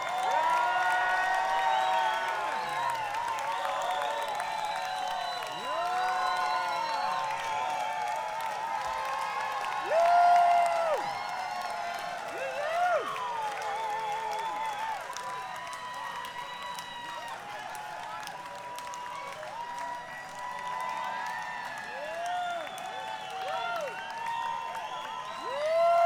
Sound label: Encore Crowd